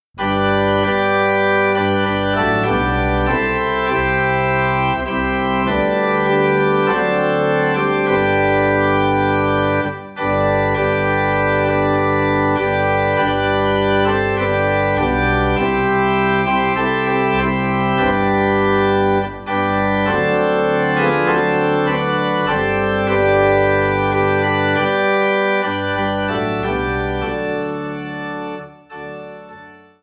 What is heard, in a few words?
Organ in G